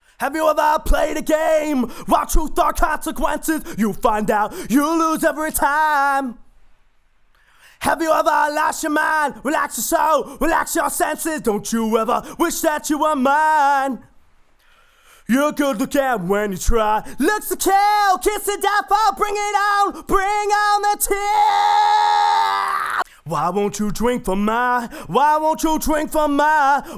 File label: original lead vocal